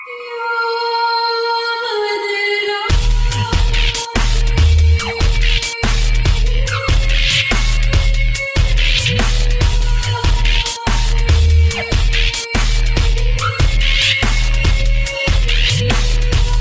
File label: Arp Bass; Demon